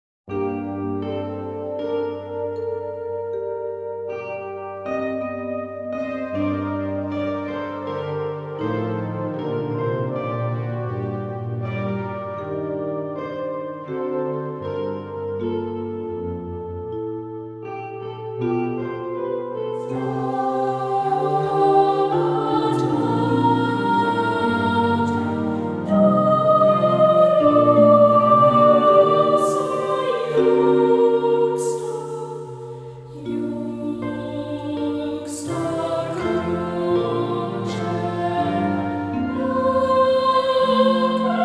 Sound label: Boys Choir, Stabat Mater; Agostino Steffani; 外文宗教歌曲